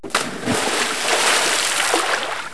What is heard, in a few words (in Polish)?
RYBA